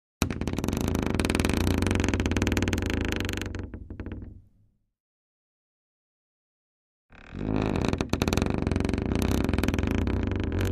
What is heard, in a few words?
Door Wood Creak Slow Deep; hinges & creaks